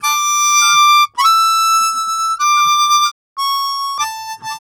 Harm 098bpm A, Harmonica

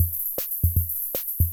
Loop, classic machines, rhythm77

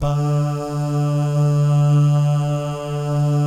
BAH GUY, Vocal Planet Jazz & FX; ILIO; BAHS GUYS

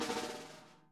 SNARE, Interactive Drum Kits

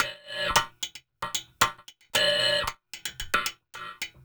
WD HAT; Spectrasonics; Liquid Grooves 1 & 2

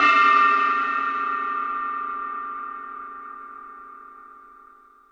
Lazer Drums, drum machine

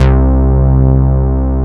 KRAFT BASS, ROLAND MKS-80; Rubber Chicken Software Sample Bank

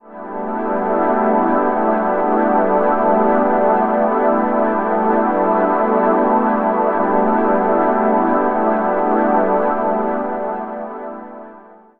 Ambient Pads; Zero-G Seismic Frequencies

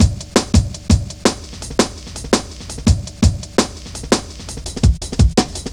Tha Size, Drum Loops, Jungle, 167.5

